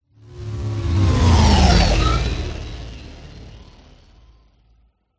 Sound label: SPACE RACER GLIDER PASSBY RIGHT 2 LEFT